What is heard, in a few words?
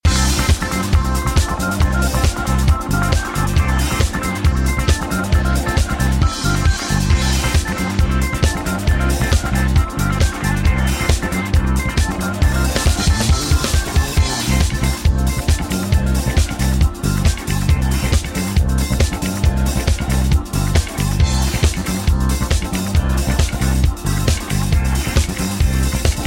drone; flOw